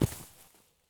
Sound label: landing grass low; Escape from Tarkov